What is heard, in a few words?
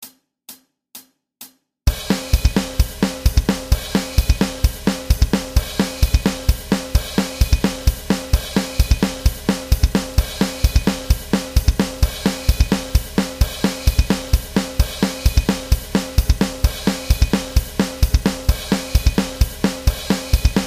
thrash, bass